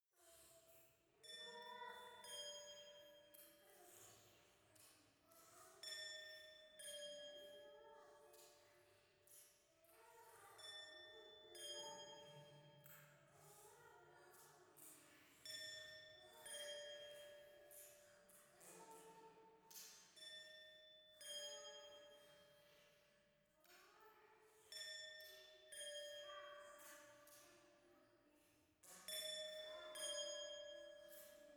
夢の中 イラマチオ 寺

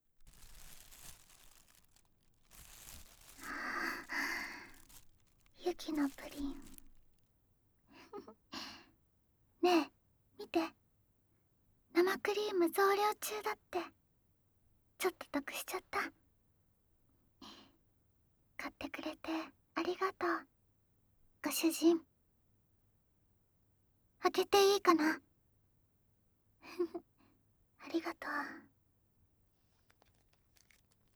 お菓子食べさせあいながらの発情求愛ベロチュー耳舐め手コキ

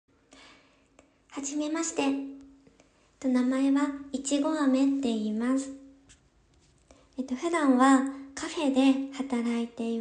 ガチ排便

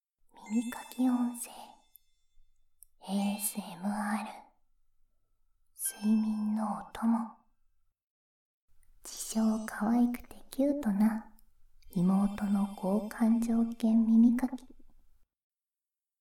耳かき; 「全編」自称可愛くてキュートな妹の交換条件耳かき

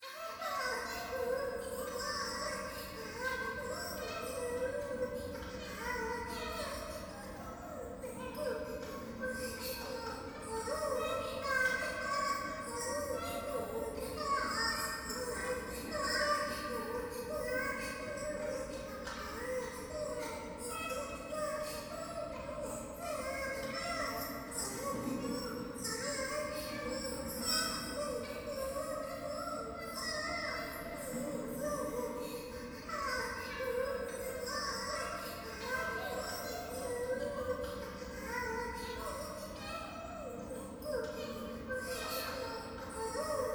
トイレ 可愛い喘ぎ 電車

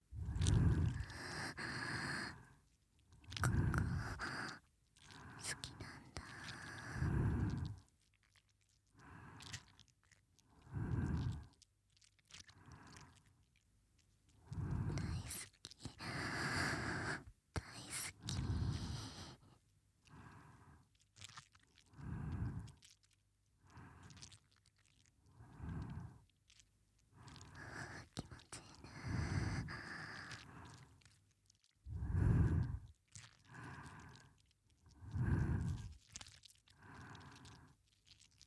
乳首舐めループ音（通常ちゅぱちゅぱ）

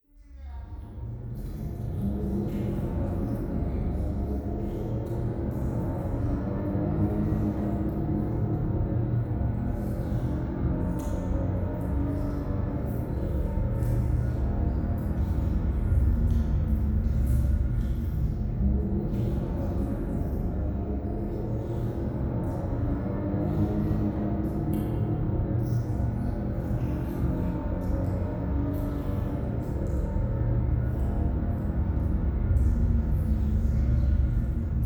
教会 フェラチオ 車の中

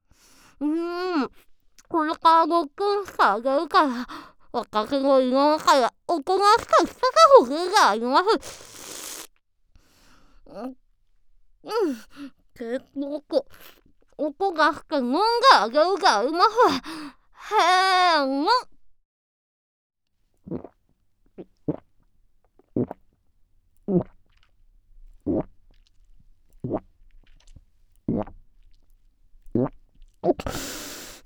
長く響くビブラートゲップ！; ザーメンゲップ集

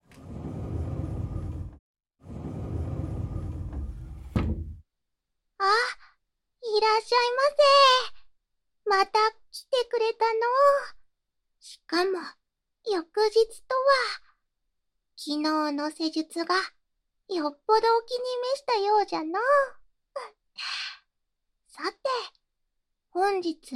耳舐め（乳首いじり）・乳首舐め・乳首舐め手こき・お掃除フェラ

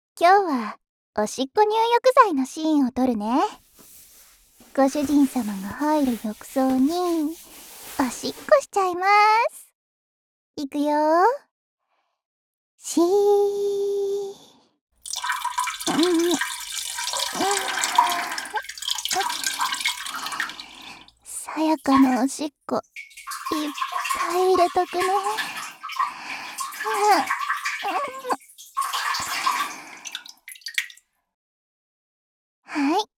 今日のおしっこ 浴槽におしっこ入浴剤

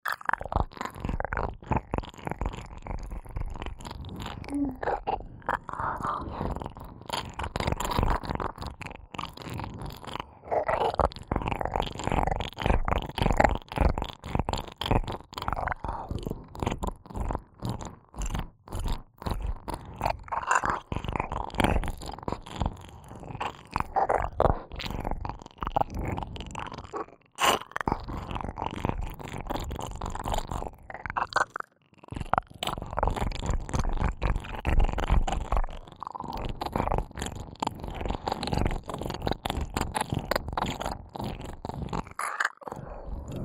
ぐぽぐぽシンクロ耳舐め; 耳元ループ; おまけループ（オナサポ）

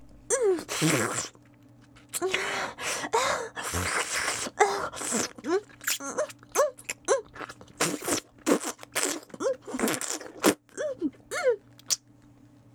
喘ぎ声フェラ

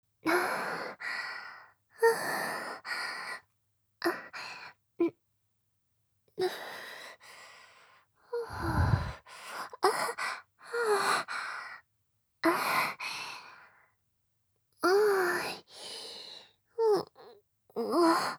喘ぎ声（正常位・ゆっくり）

